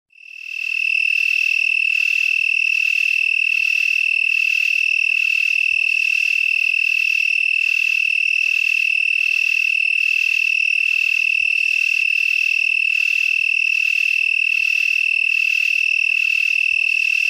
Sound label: broad winged tree cricket